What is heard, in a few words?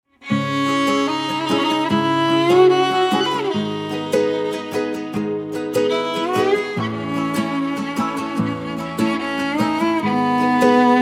Guitar; Guitar Pack 1